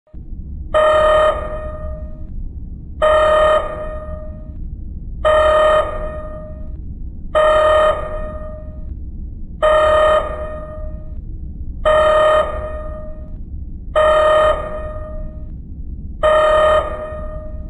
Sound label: RED ALERT SOUND EFFECT; STAR TREK VOYAGER